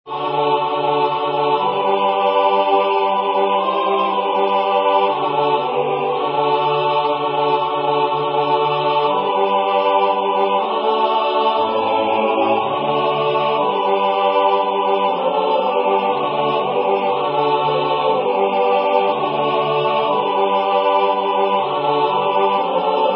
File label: Kievan Chant Tone 3